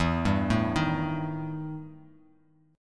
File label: E Minor Major 7th Double Flat 5th E Bass Standard; arpeggiated; JGuitar